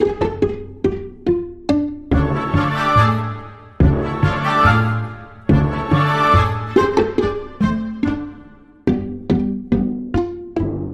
No Perc

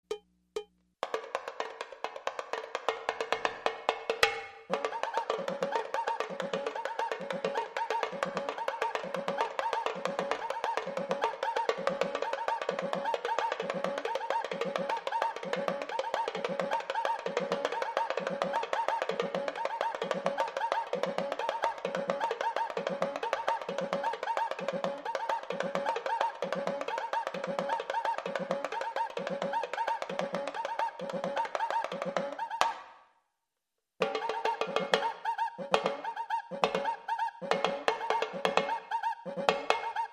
Carnival Loop

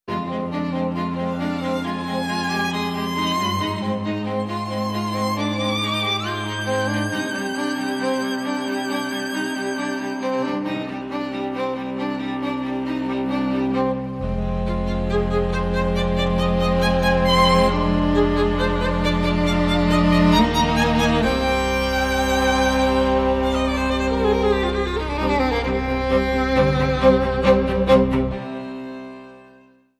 Strings Only

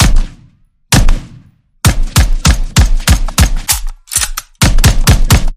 Firefight Pulse

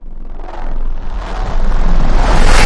Combat Unit Assault Suckback

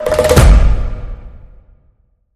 Charmed Roll Hit

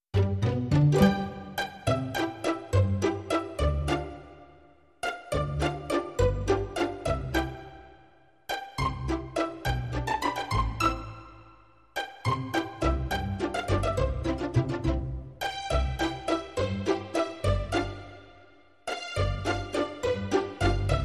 Tip Toe Waltz

